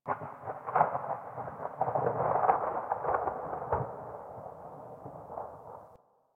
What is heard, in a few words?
Landscape Distant Avalanche, Ambience; Warframe; Venus Open World; One Offs